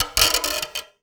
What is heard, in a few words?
Classical Guitar Extended Technique